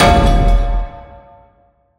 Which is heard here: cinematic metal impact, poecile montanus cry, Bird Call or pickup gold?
cinematic metal impact